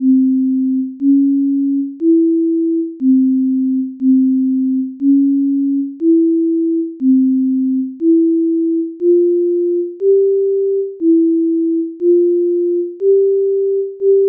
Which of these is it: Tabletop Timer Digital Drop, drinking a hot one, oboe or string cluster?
oboe